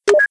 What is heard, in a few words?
Switch Elec Swap; Playable Tutorial Level